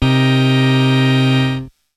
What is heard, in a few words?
HOUSE CHORD; TECHNO-BOX; SYNTH+BASS